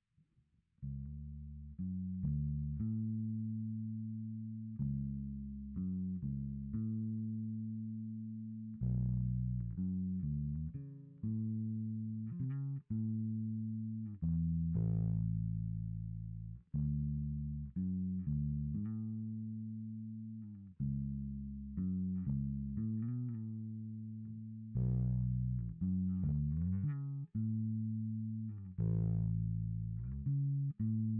Bass Sansamp

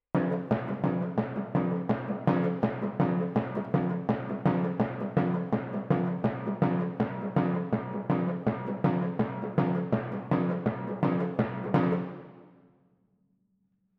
Twin F Timp

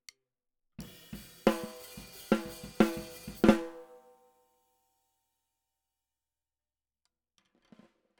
SNR

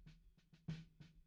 BD In

